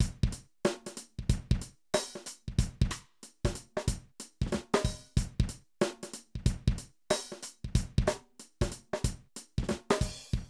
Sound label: Drum loops, 70s funk